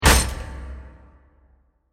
Smashed Metal Impact With Reverb; Bursts (Sound Effects:Impacts)

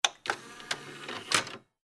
Technology Cd-Rom Disc Tray Close Computer; Computer Sound Effects; SFX Bible 6

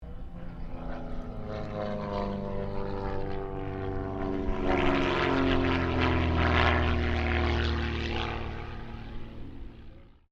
AIRCRAFT CESSNA 195 PASS; Airplanes; Vehicles; Aircraft Collection; Twistedtracks

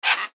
Bird Raven Squawk; Animal; Birds; Blastwave

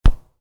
Soccer Ball: Header The Ball; Football; Sports

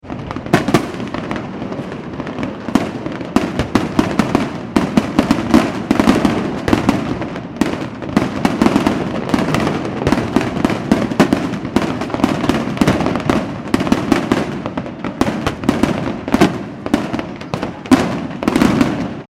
fireworks display intense; Intense Explosions At Large Commercial Fireworks Display; McKinneySound

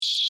Insect Voice Scream, Vocalization, Bug; Animal

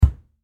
Impact Solid, Thud; Thuds

